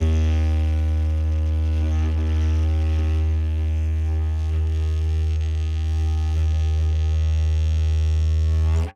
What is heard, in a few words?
organic didgeridoo elongated